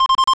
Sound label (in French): alarme; bip-bip et cie